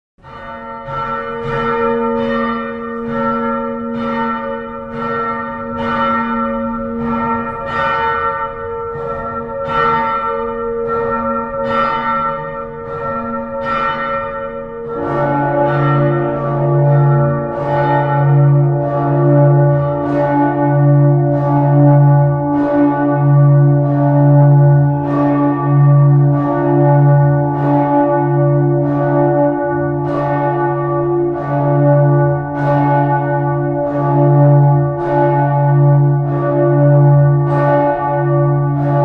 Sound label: Cathedral Bell Tower; bell towers